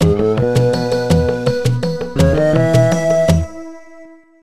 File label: Sting Gen Good; simanimals africa 2009 nds gamerip